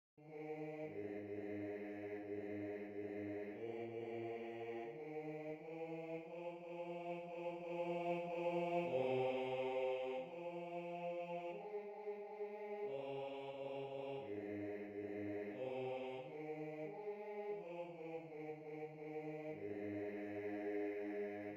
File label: Bass II; I'm Troubled